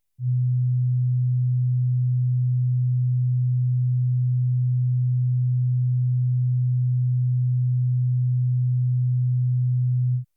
125Hz測試訊號; 雨果金碟

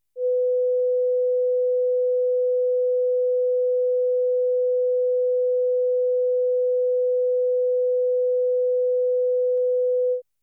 500Hz 測試訊號; 雨果金碟

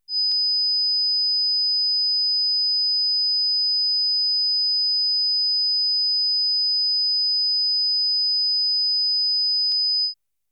5KHz測試訊號; 雨果金碟

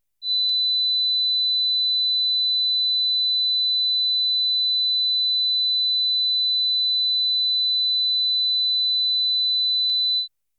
4KHz 測試訊號; 雨果金碟

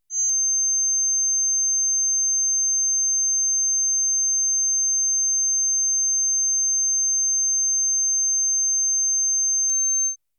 6.3KHz 測試訊號; 雨果金碟

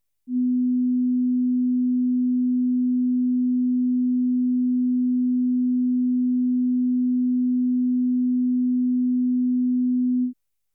250Hz 測試訊號; 雨果金碟

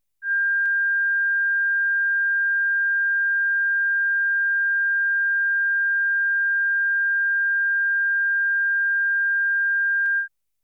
1.6KHz 測試訊號; 雨果金碟

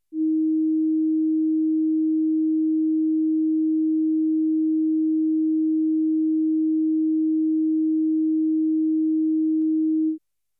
315Hz 測試訊號; 雨果金碟

